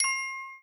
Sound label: chime